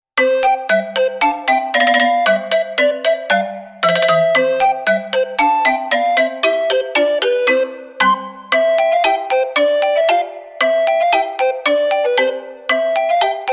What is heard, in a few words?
Toy clock